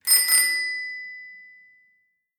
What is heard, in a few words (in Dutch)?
fietsbel